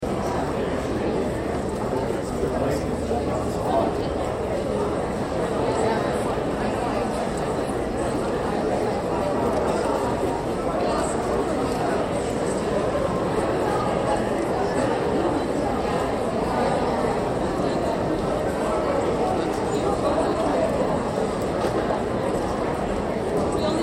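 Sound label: Crowd High School Graduation Idle Chatter; Auditorium; Backgrounds; Crowds; Big Room Sound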